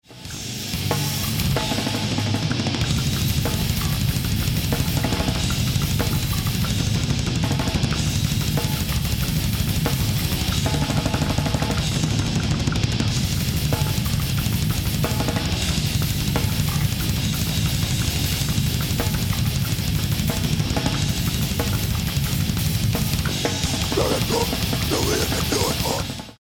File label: bass